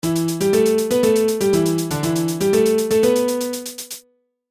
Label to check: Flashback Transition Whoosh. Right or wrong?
Wrong